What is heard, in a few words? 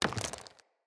Mockery Foley Movement, enemy; Mockeries; Characters